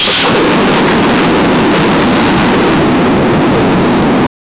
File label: jumpscare sound of an old; blood